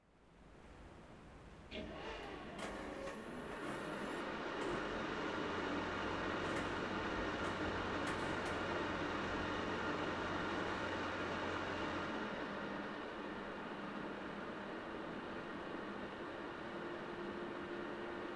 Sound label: PC Operating Noise Far On Off